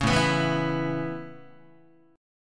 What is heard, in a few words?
A-Major Add 9th Guitar, Aadd9 chord; Aadd9 strummed; JGuitar